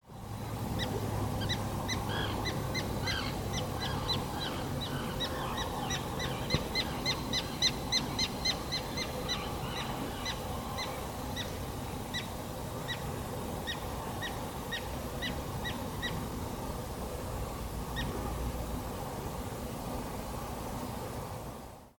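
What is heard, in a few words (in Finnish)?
kalatiira varoitus; linnut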